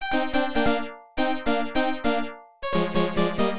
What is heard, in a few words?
Game Over; major league pre sega system 16 arcade